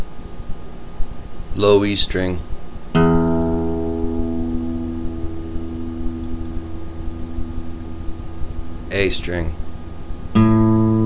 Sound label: tuning all